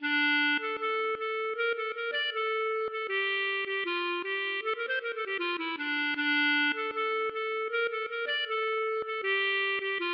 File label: klezmer